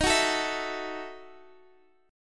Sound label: E Major 7th Suspended 2nd Suspended 4th Eb